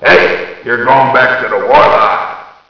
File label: Back Ship